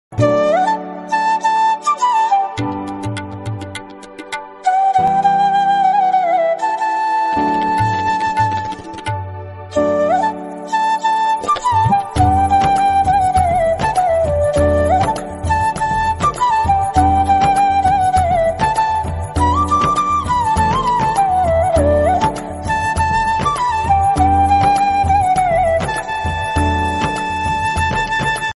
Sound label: instrumental flute ringtone; covered by beautiful lady